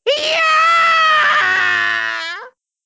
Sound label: waaaooow; luigi; sm64coopdx; sfx custom luigi peach